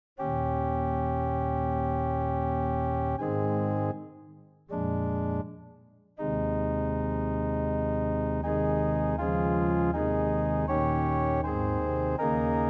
sopraan, koorpartij oefening; Somervell (Arthur); The Passion of Christ

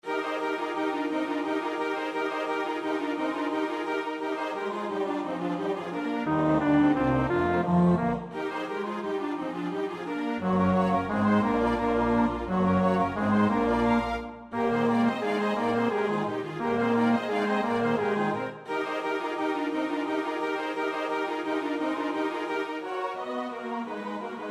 bas 2; Poulenc (Francis); Stabat Mater

